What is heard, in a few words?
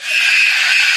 smack